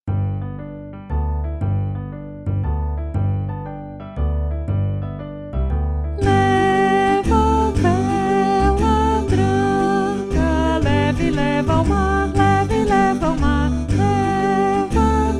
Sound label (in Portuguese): naipe baritono